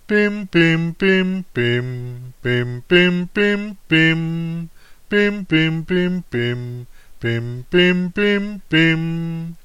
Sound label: Big Ben